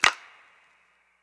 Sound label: snap; eddyflux; dough-samples